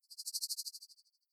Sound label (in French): Vague Aigue De Crotales